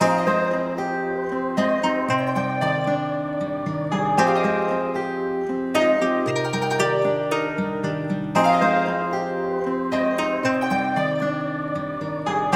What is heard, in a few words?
115 BPM C Min, World; Beijing; Cymatics; Destiny Premium Melodies (BETA)